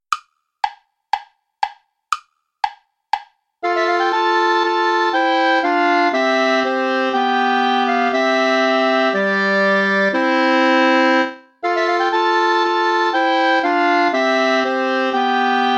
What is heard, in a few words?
bransle play along without clarinet; praetorius michael gentil